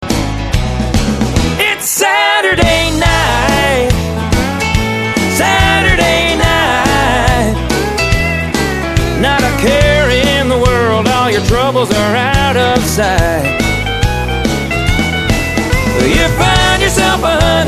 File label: chorus